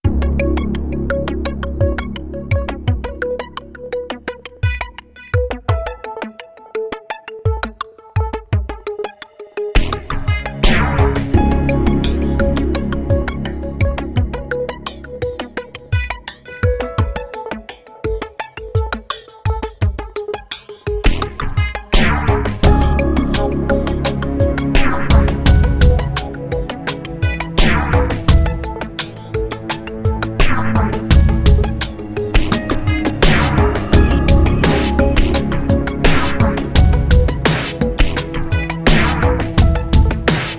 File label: front end music; Pulzar; PS Vita